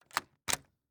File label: Steel, bolt, switch, engage, thick, quick; Eiravaein Works; Vaeyan IV